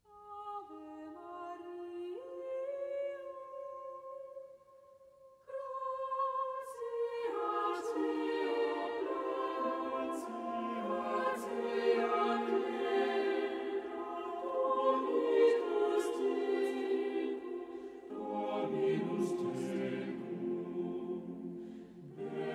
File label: Ave Maria, Singers